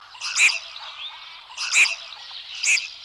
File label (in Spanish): pato colorado